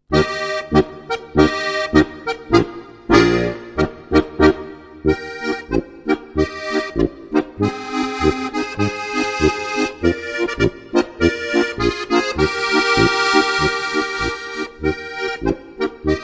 marsch; Leicht & Guat - 15 leichte Märsche

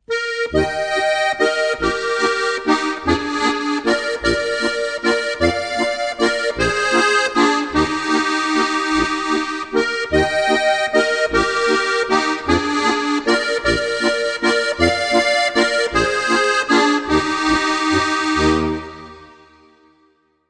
walzer

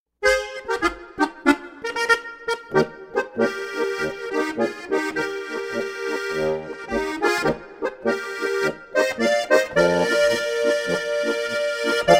weisswurst polka

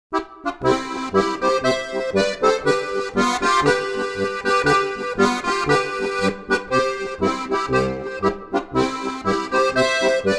polka

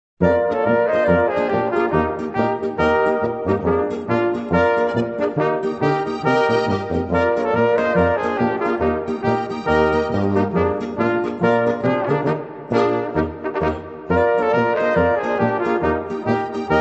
polka

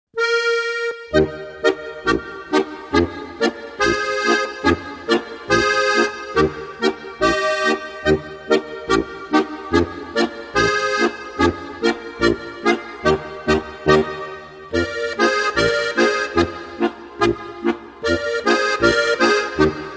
boarischer

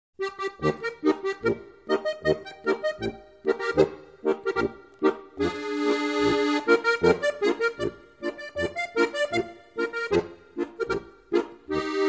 boarischer

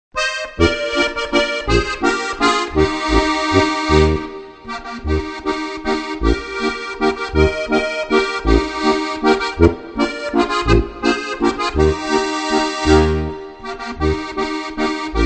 Musikanten spielts auf steirische Harmonika; 1000 beliebte Gstanzln

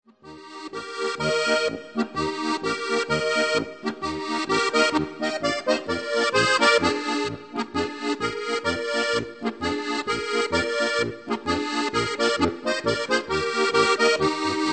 polka

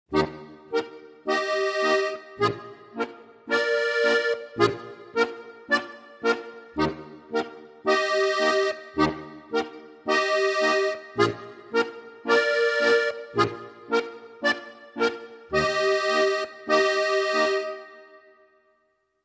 polka

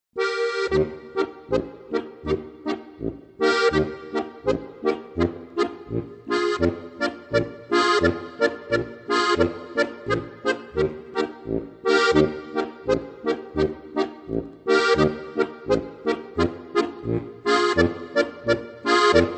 boarisch; Mein erstes Spielbuch

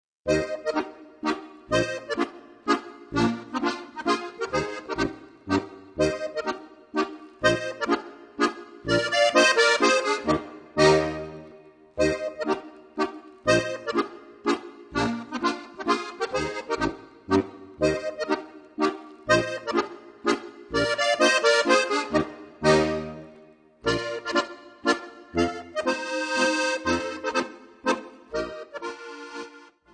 kleine mazurka

